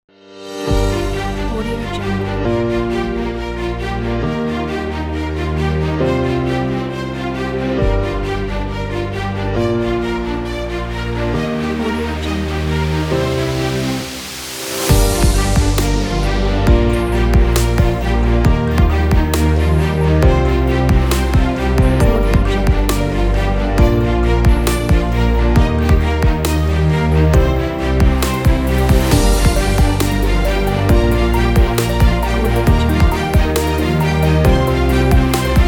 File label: cinematic dubstep; blackcrocodile